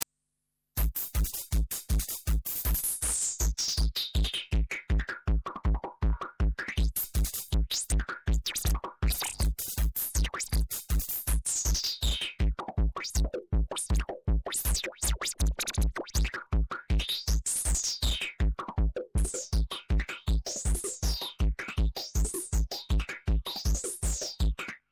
DRUM

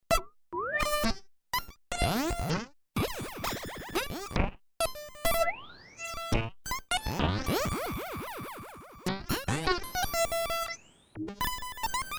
spazz moog

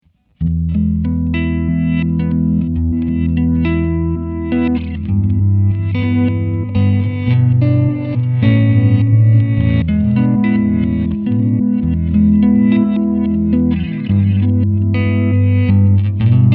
let it ring

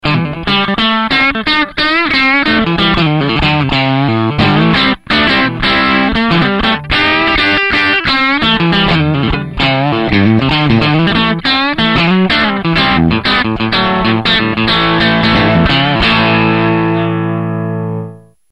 Vox AC-30 Top Boost

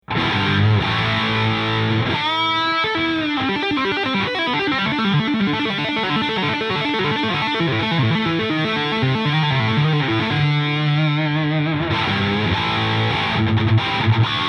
UK 80s

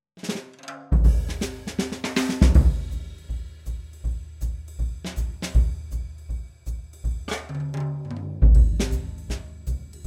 Strike Be Bop Solo

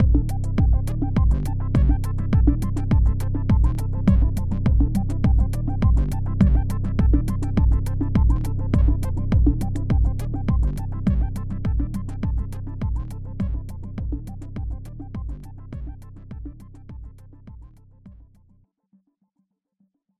Minimal noise

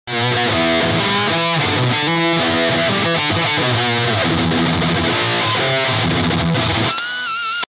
Metal Muff crush

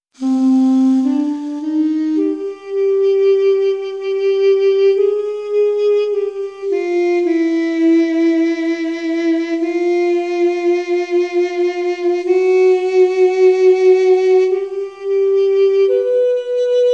Daduk Tone Verb

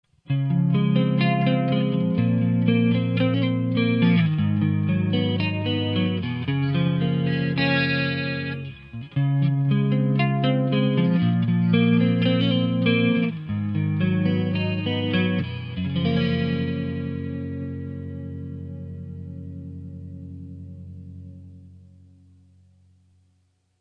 RG100 Clean Verb

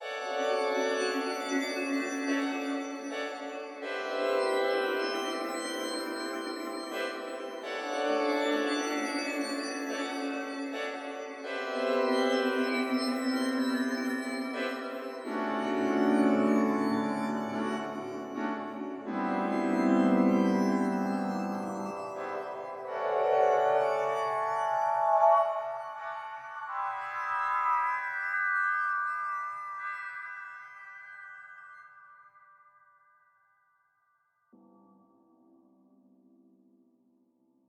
Imaginary Windchimes

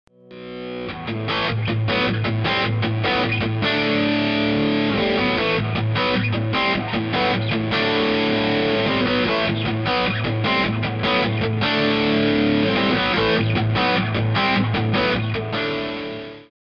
Power Chords

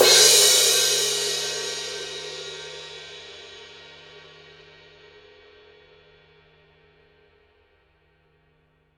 crash edge

